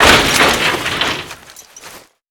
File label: big jump land; Call of Duty Modern Warfare